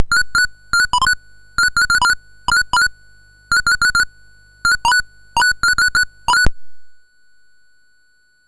Dragonworld sid MOS6581R4

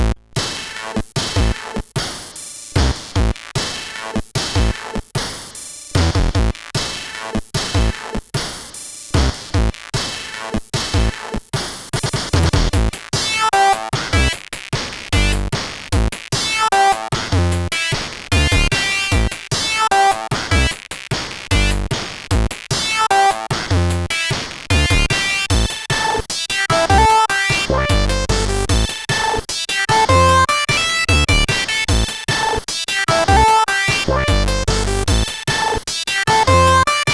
CSG8580

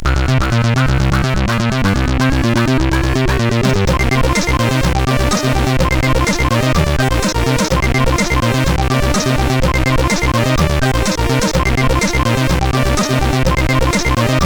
Orcus dark lord, CSG8580R5; hvsc

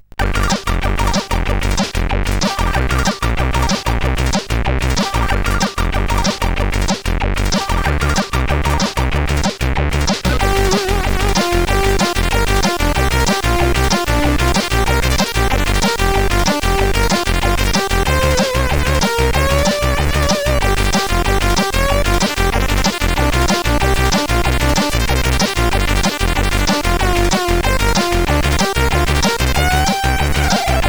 sid, CSG8580R5

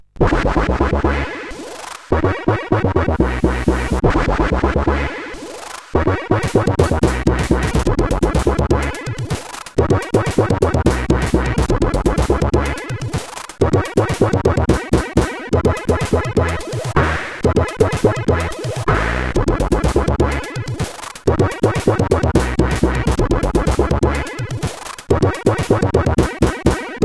sid, CSG8580; hvsc

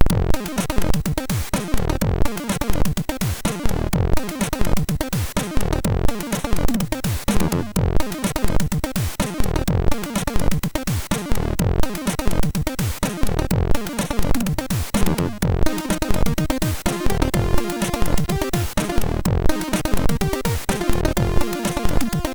Short Popjazz, MOS6581R3; sid

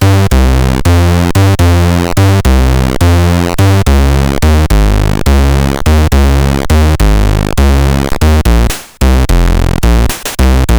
Modwheel Pitch Symphony, CSG8580R5

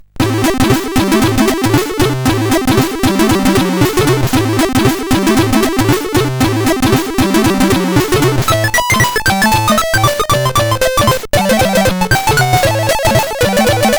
Intro, SID CSG8580R5

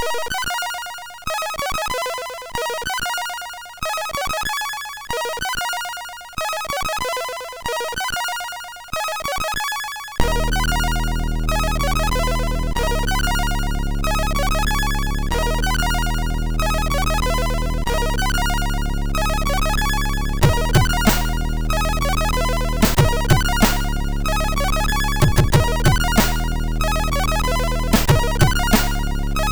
Punk, sid MOS6581R2; Creo

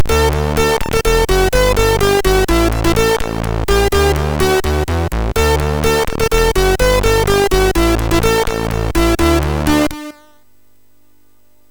Sinister 5 intro, sid MOS6581R3; hvsc

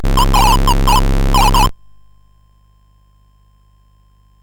Dragonworld sid CSG8580R5

